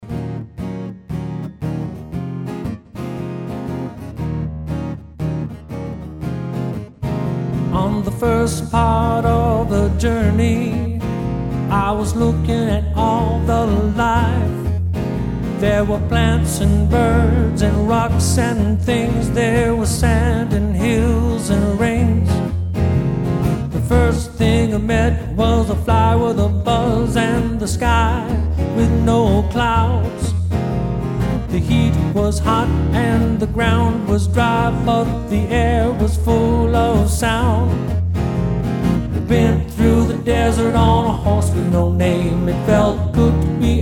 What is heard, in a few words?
acoustic guitar